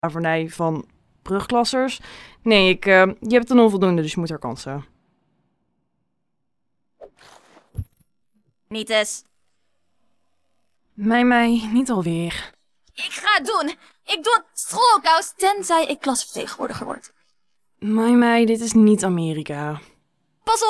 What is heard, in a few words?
vocals